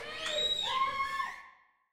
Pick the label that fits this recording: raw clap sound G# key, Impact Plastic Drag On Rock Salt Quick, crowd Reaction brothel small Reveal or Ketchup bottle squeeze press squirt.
crowd Reaction brothel small Reveal